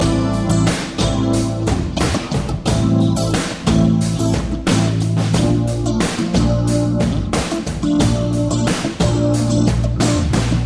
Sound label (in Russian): zatsiklennye melodii dlia gitary loop